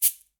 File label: Cabasa Short; Latin Percussion; Drums; Drum Hits; Shaker; Ableton; Factory Packs